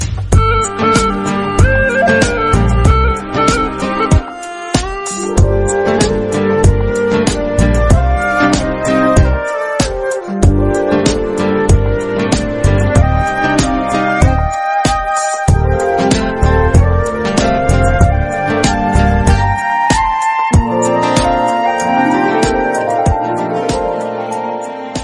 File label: Instrumental Song Ringtone